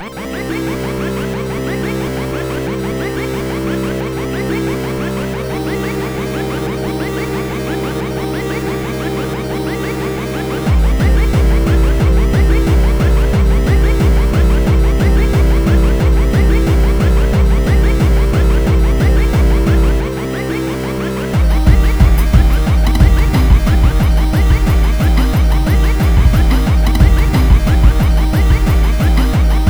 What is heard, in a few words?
8bit happy, fast music